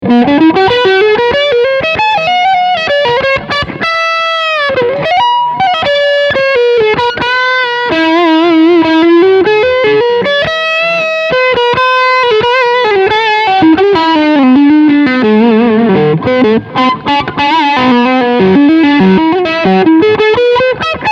Leadsound Singlecoil Steg

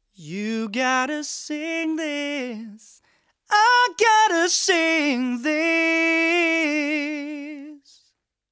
komp light slow

